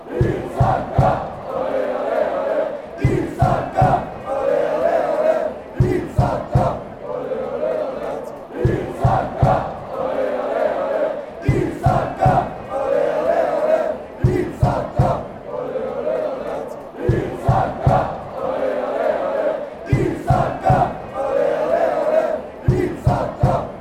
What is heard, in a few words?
ole ole ole